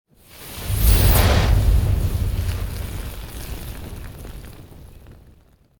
FireBall; Evolve; Meteor Goliath

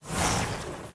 Burning Hands Whoosh Tails; Evolve; Meteor Goliath

